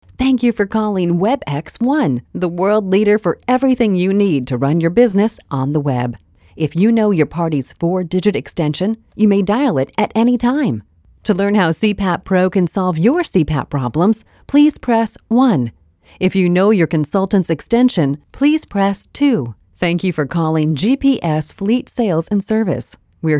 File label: on hold